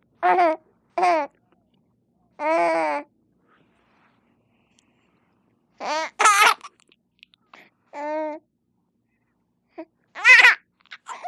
Baby Girl Laughs, four month old

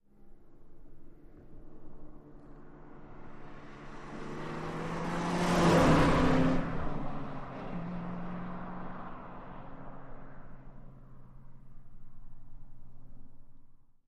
Car Bys Fast Singles

